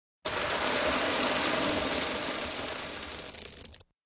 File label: ROCK: EXT: Light gravel pour.; IMPACTS & CRASHES - ROCKS & SAND